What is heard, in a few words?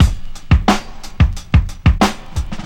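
90 bpm drum beat d key, kick tuned to the D note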